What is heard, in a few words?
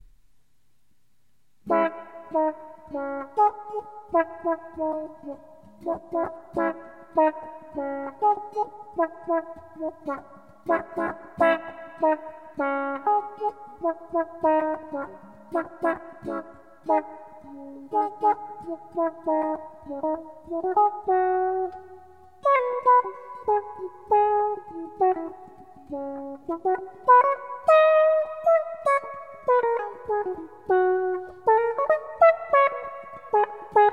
envelope filter; envelopefilter-demo